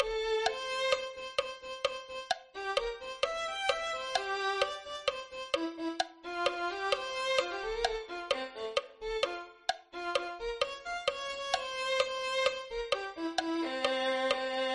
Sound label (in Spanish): violin